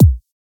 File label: Kick Soft Bright